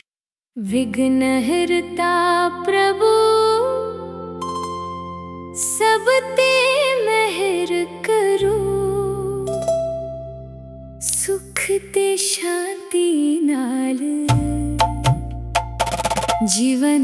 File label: punjabi, ringtone; ganesh